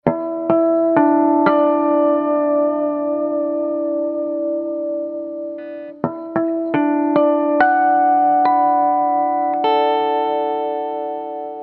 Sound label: gray sky gibson 335 clean; Swart Atom; 5W Class A - Single-Ended - 6V6 or 6L6 - Tube Rectified; Triode REVERB